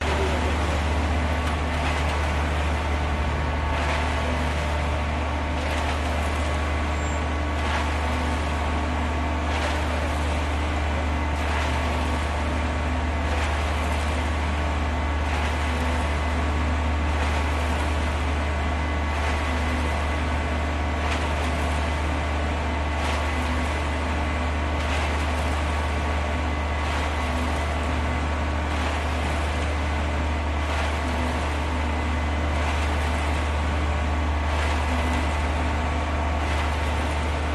concrete mixer truck; la paz; your free sounds

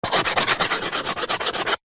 chalk stick thick scribble on small chalk board; office

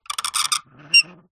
foley wood balustrade turn squeak; zapsplat

